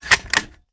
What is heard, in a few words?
rechamber bolt close; reloads; gmod